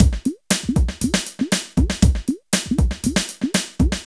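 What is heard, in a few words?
drum loops, right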